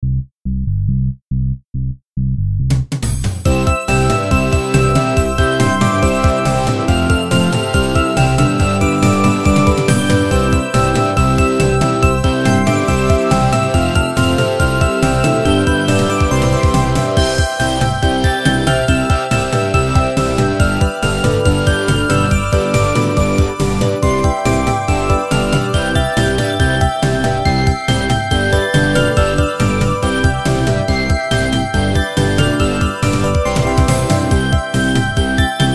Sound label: xmas rock